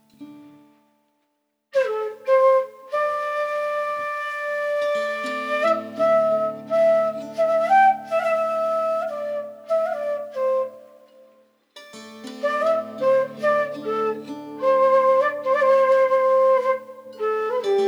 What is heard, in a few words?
meditation consecration